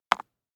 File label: DICE on Cardboard, Drop, Standard, Two Dice; Samples; ArticulatedSounds; DICE Sfx Party (Extended)